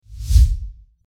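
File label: Gentle Swoosh transition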